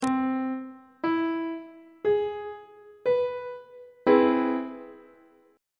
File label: d double flat augmented major 7th chord; treble clef